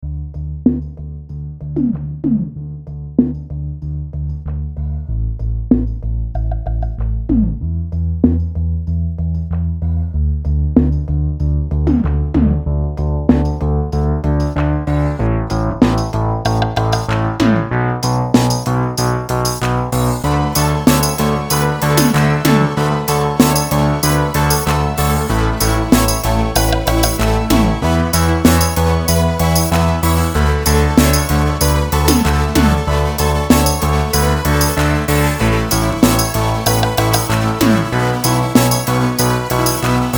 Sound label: synthwave mix